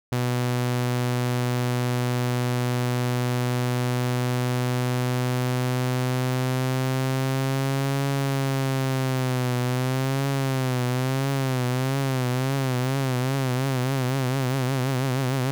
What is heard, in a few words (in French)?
LFO rate de 0 à 20 Hz puis retour à 0 Hz variation du pitch